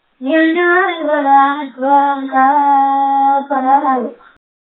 suasana di dapur, food preparation

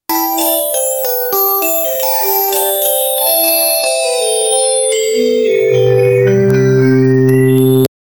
intro keren kartun 2d

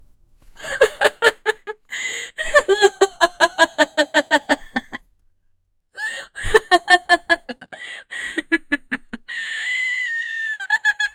tertawa yang kreatif untu; creative

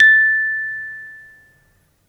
melodi sukses 3 nada

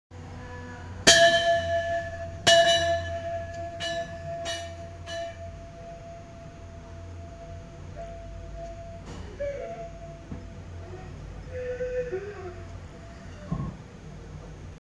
kertas yang tergeser

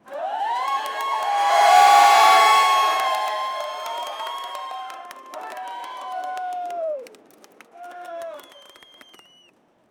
angin kencang keramaian

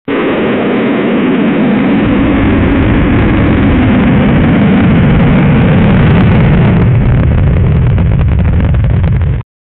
suara roket mau mendarat

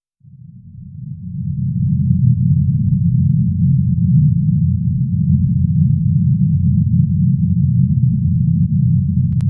suara berada di ruang, buttons

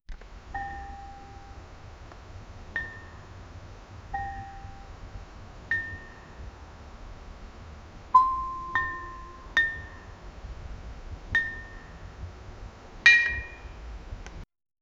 angin malam detak jam; mysterious

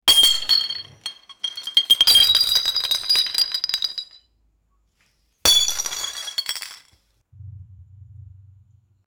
suara banyak botol pecah, break

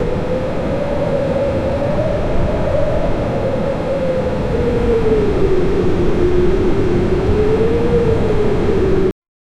angin kencang badai, hurricane

